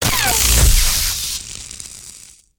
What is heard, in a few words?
shield deflect; CosmicRage; ships combat